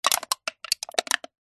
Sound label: drink can empty crushed handling movements twists crinkle